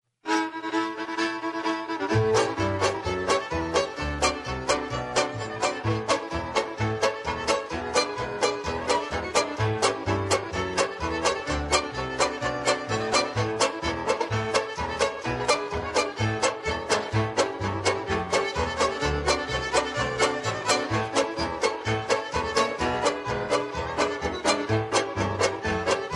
whirpool hoedown, Instrumental TRacks, square dance music